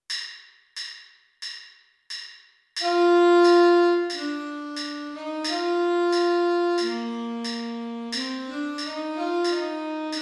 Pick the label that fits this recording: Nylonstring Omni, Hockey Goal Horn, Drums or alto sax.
alto sax